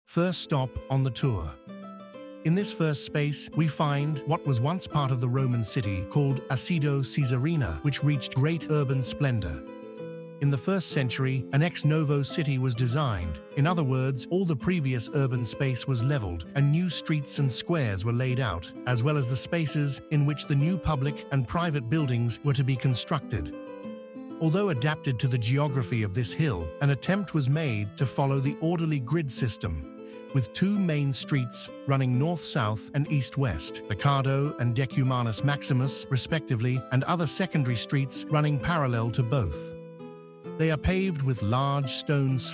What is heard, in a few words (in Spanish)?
música; archaeological museum audio guide